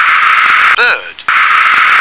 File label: bird